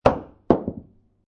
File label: Billiard Pool Snooker Ball Goes Off Table And Lands On Floor